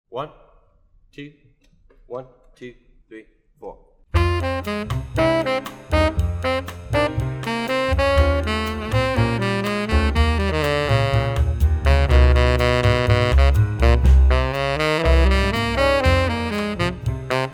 latin tenor saxophone

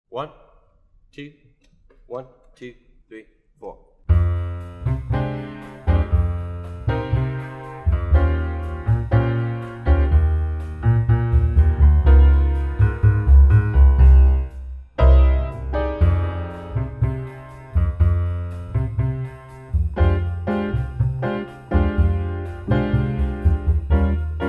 latin drums muted

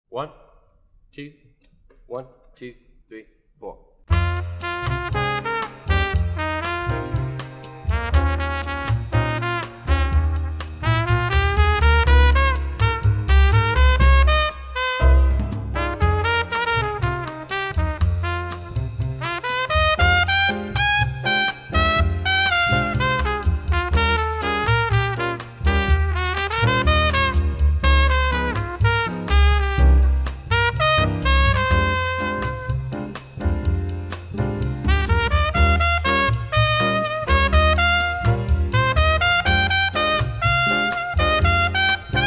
latin trumpet

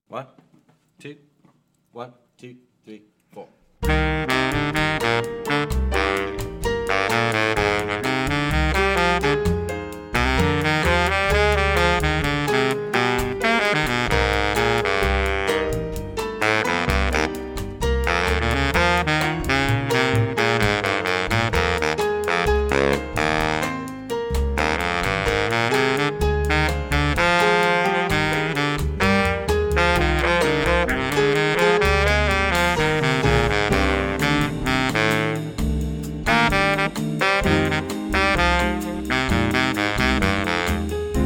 latin etude bari sax

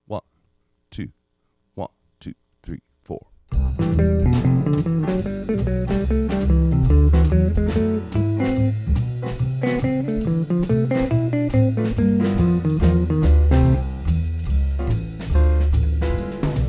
swing rhythm section with guitar solo

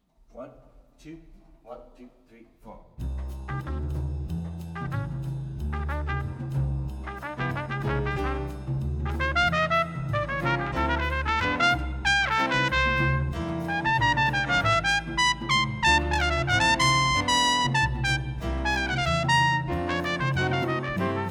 latin lead trumpet

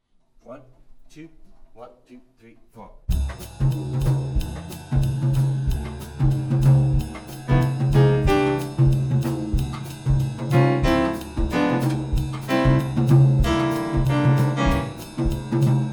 latin etude rhythm section bass muted

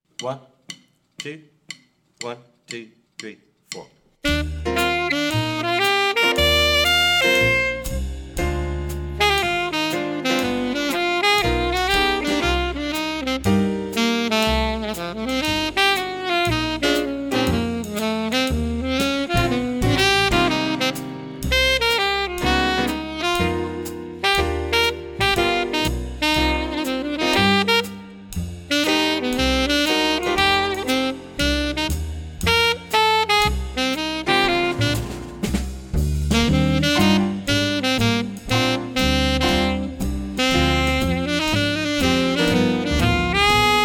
swing etude alto sax

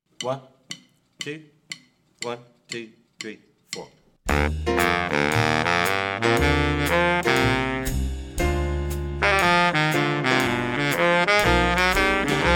swing etude bari sax

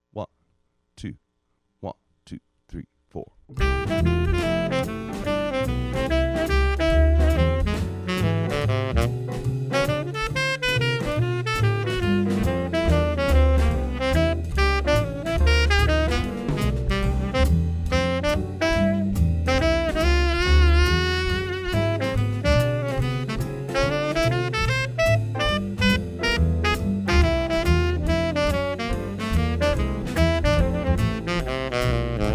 swing tenor sax

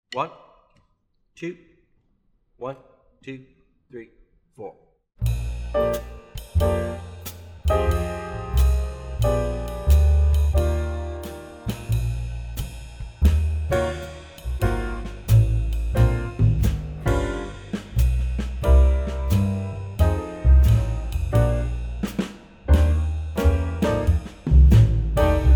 swing guitar muted

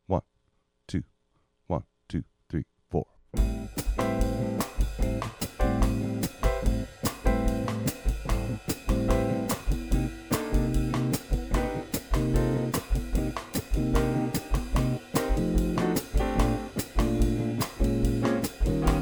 latin rhythm section bass muted

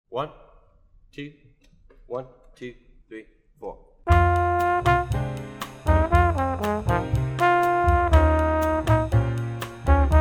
latin tenor trombone

